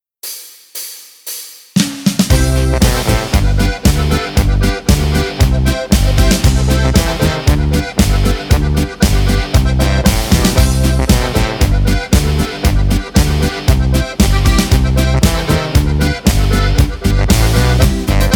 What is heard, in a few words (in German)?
Boarischer